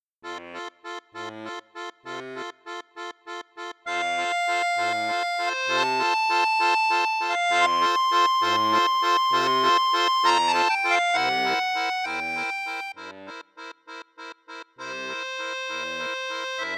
registre 8, accordeon; borsini concerto medio

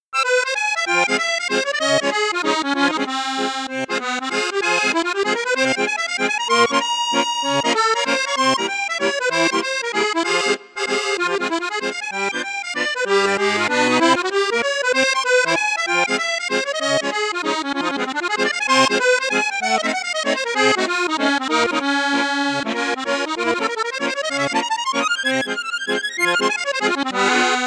registre flute juste flute haute, cavagnolo bal musette accordeon

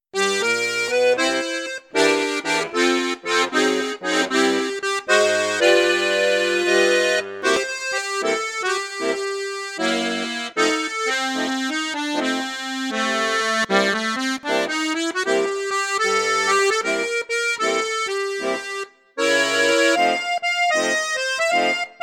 registre basson flute juste flute haute, accordéon; mengascini f4

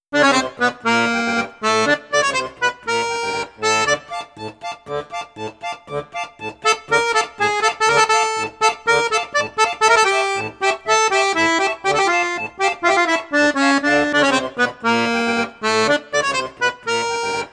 registre flute piccolo; pigini star jazz

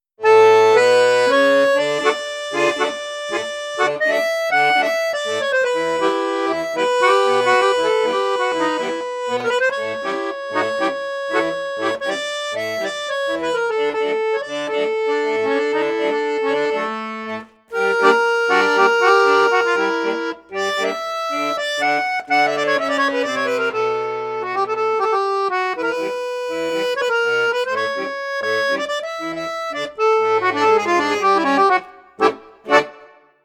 registre basson flute juste, accordéon; crucianelli super king

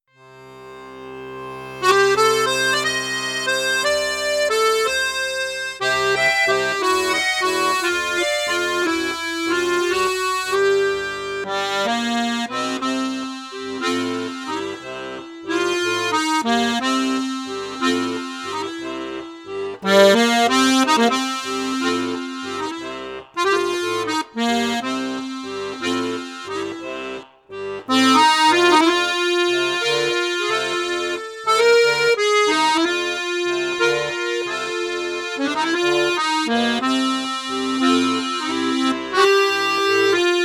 registre flute juste flute haute, accordeon; cavagnolo orchestre v

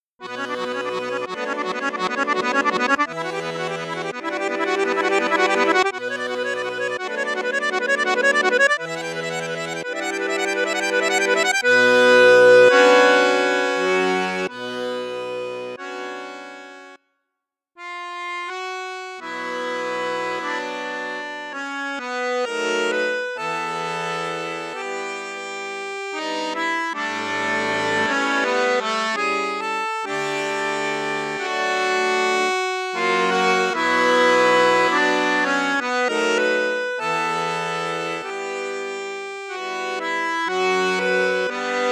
registre basson flute juste, accordeon; piermaria 315

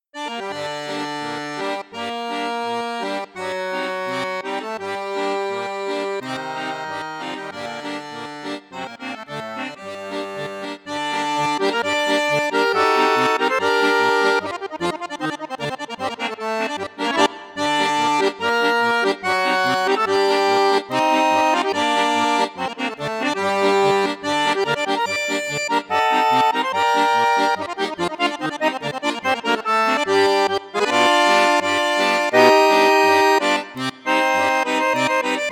registre basson flute piccolo, accordeon

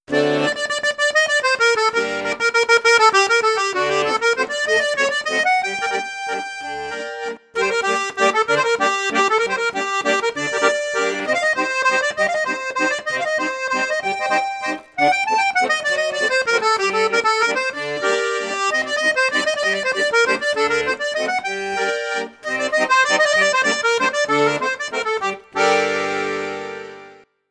registre flute juste flute haute, accordeon; crucianelli super king

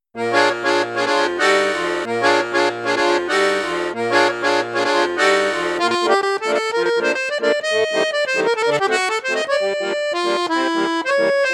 registre flute juste, accordéon; mengascini f5

